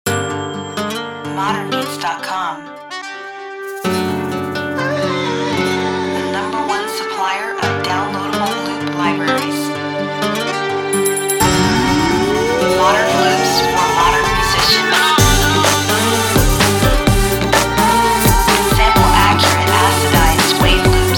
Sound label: RnB Klub Music Loops